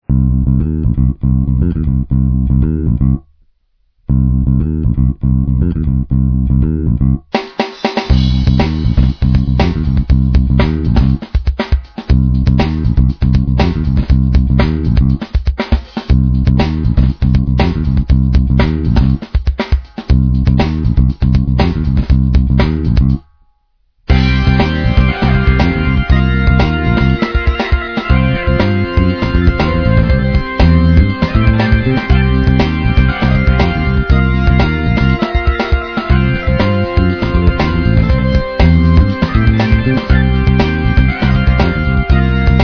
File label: Backgroundmusic